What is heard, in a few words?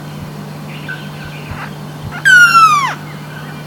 Carponis melanocephala, PETAR Brazil; N Santana